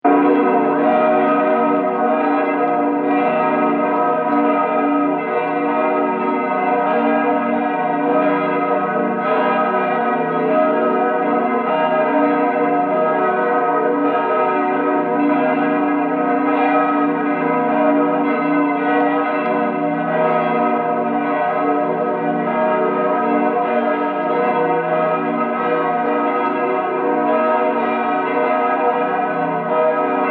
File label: Church Bells Cathedral; Musical